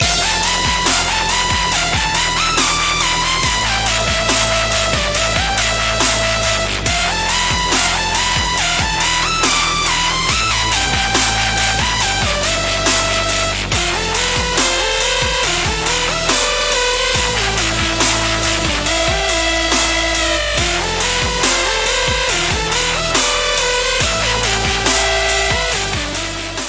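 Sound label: Wood Dubstep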